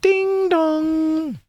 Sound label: Ding Dong